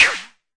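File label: mbrute snare, Arturia MiniBrute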